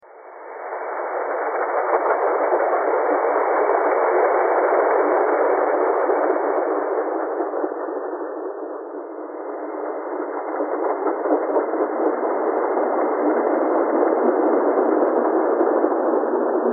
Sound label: train thread all; twisted cords ::: an exercise in chance-infused, text-based, generative composition.